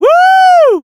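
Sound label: positive vocalization, French; Scout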